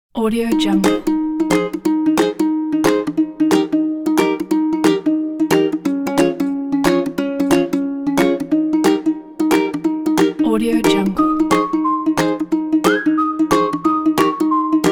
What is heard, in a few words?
fun and upbeat